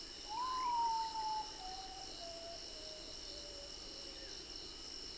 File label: Common Potoo